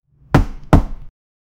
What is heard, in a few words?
a loud sound from hitting, hard hit